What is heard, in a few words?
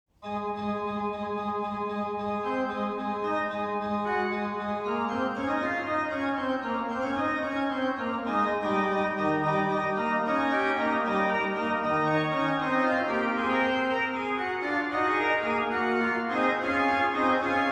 Organ, Fughetta; Bach Organ Works Clavierbung III; BWV 679; super Dies sind die heiligen zehen Gebot